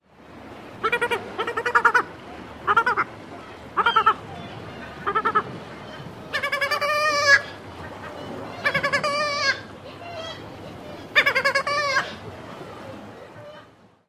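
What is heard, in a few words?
alarm calls near nest, Black-legged Kittiwake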